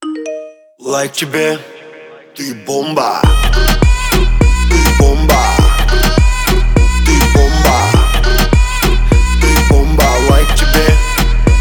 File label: Shake Russian